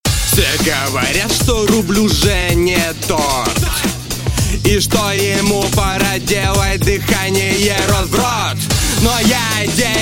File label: glitch hop